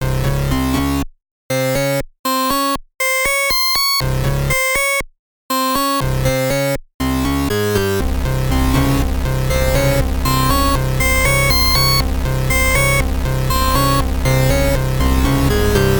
Saw Lead; straightliner

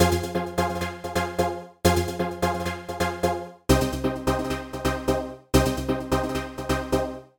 Minor Chord Stabs Pattern; echolab

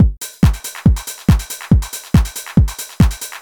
Four To The Floor Pattern; echolab

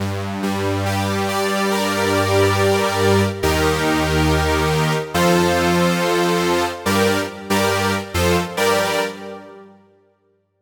Saw Lead; straightliner

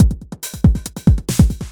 Elektro Beat Pattern; echolab

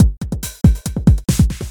ElektroBeatDiPong; echolab

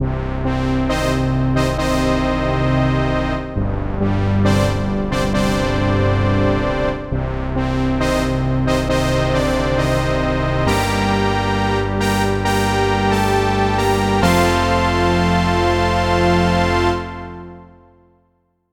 Brass Section; straightliner

